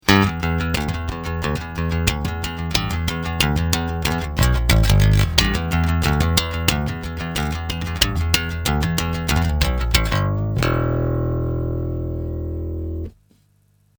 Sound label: Treble boost